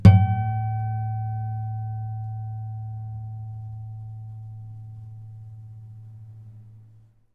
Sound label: harmonic, strings harmonics; 5 harmonic